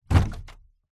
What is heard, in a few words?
industrial 10 litre plastic drum water heavy set down